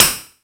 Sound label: HHat FMD Fink Open; Drums; Ableton Foundation FreePacks